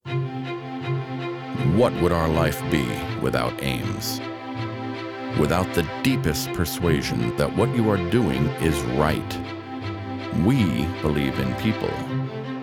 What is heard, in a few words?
Capitalista music